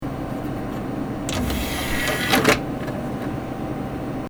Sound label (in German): PC Laufwerk rein